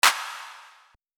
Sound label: Clap